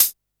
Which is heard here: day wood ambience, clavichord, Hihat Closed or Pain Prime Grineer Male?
Hihat Closed